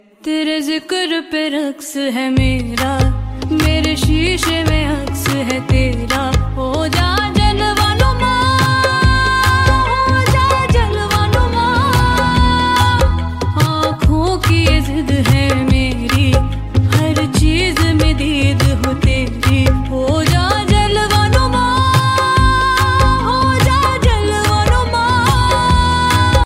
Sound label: hindi ringtone, bollywood ringtones